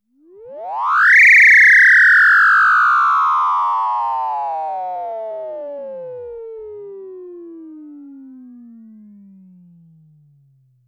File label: VCS3 FX, EMS VCS-3; Drums